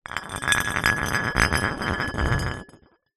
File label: glass bottle drag pebblecrete ground; foley